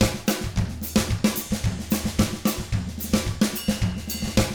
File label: 110 SAMBA, Drums; ILIO; Double Platinum Drums 1